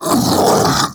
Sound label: Infested Splitter Split Vocal Attack Medium; Enemies; Vocals; Warframe; Grey Strain